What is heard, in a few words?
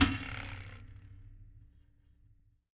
Colide